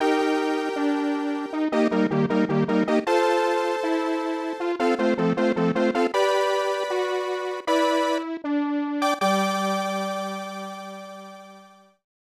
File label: Unknown Jingle; Metal Max 2; snes